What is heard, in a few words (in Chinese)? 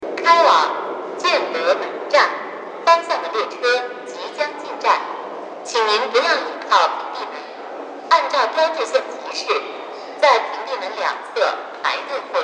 10号线健德门站方向进站